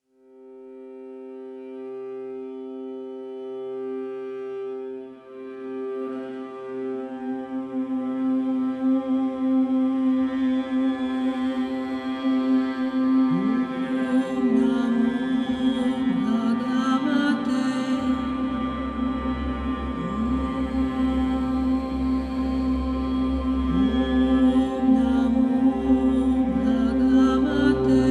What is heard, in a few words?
Om Choir Mantra Repetitions